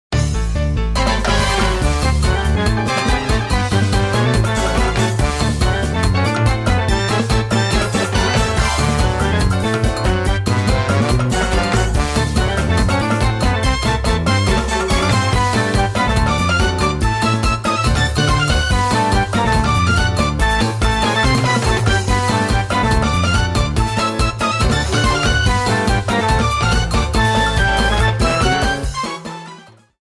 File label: Solo